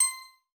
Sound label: counter